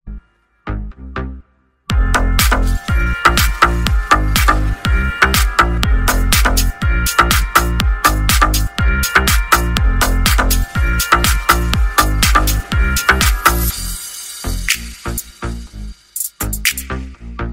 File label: Instrumental English Ringtone